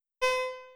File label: pickup coin; YokaiSurvive